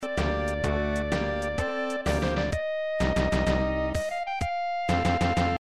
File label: music water; e.v.o. search for eden